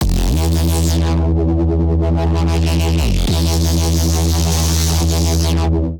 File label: F Major 160 bpm, Bassline; MIDI Clips; Tonal; Rinsin Gritt; Skitter and Step; Ableton Factory Packs